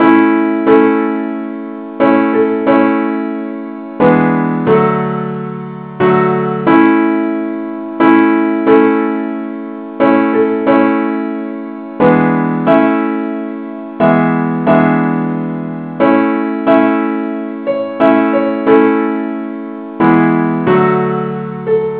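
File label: simple accompaniment key A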